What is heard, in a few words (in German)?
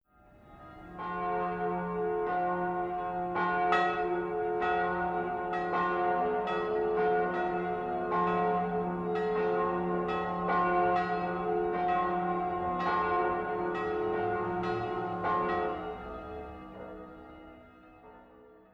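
glockengeläut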